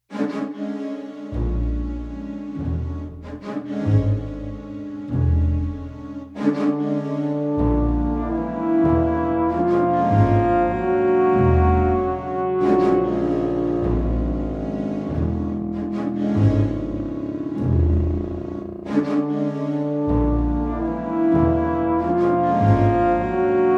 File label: Slow Drama